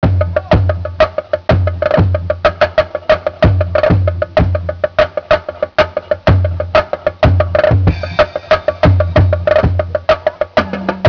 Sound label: percuss